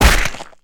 punch organic

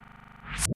object spawn

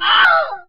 male scream

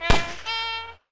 race start

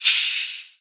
hiss

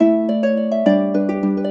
bell harp

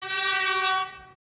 race end

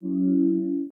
dialog open

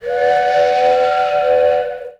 whistle

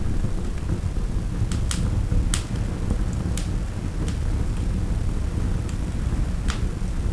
fire

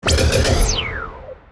levelup

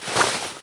change clothes

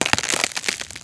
freeze

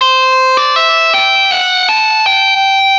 Lightning Guitar

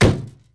metal hit

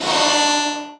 airy

